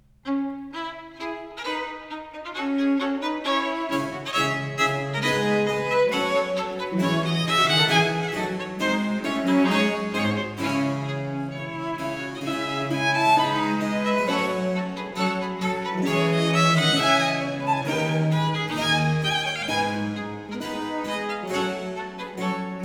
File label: Ayres for the Violin, Suite in D Minor, Fuga in Fantasia; Book 4; Presto; Italian Baroque Violin Sonatas; Nicola Matteis; Ayres for the Violin, Vol.1; 尼古拉斯 · 麦克吉根